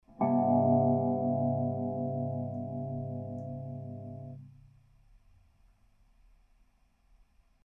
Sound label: for electric guitar